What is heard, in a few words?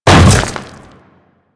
Hammer Boss Step Dirt, Footsteps